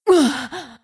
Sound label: Wound; wotlk; Creature; Lady Sacrolash